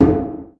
gren bounce; darwinia demo

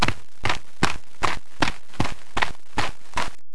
Marching

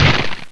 Bike Crash; Superbike 2001

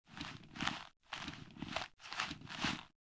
walk; poolofradiance

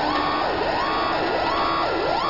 shuttle; Explorapedia

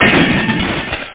splat; r3 the art of rocketry

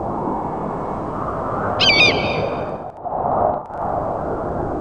canyon, ambient; lomax

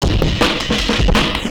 DRUMLOOP D+B 160, Drum+Bass Loop; eJay

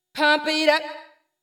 VOICE GRL HOUSE 125, House Voice; eJay

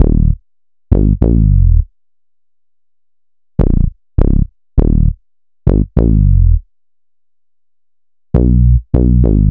bass

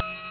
Drone; Starbound II

